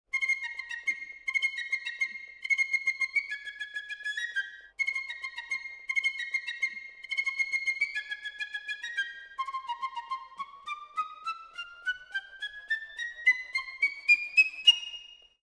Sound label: Orchestra, Jazz for piano